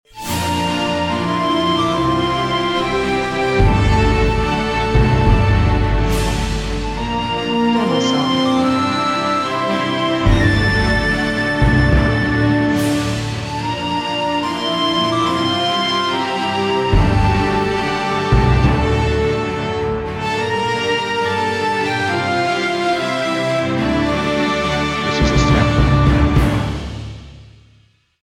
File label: Epic Happy Majestic Ending